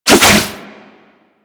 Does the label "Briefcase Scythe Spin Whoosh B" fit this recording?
Yes